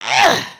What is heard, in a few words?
flex; emotes